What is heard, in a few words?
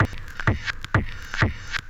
Tech loop